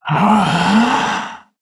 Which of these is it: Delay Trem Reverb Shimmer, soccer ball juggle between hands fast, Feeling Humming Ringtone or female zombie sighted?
female zombie sighted